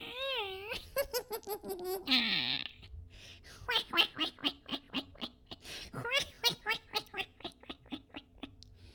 Witch S Laugh

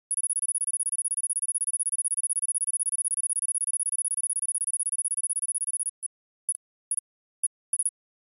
Sci Fi Computer Terminal

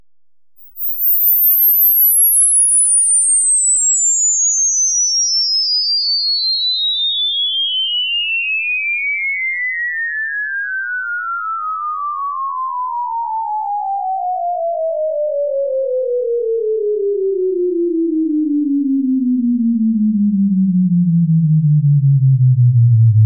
Stepped Log Sweep Down

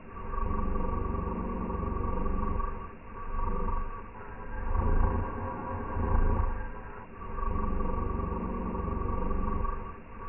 Dinosaur Snoring Loop

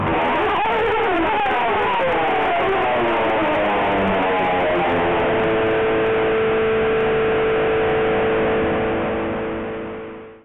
Guitar Slide Plec Down

